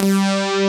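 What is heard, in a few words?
SYN SAW BASS; Pro-Rec - Synthsonic; TRINITY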